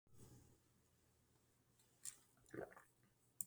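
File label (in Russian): я глотаю воду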